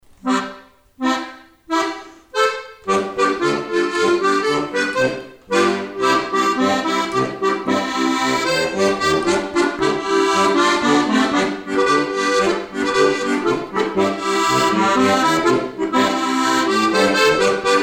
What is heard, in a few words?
Flower Polka, Loop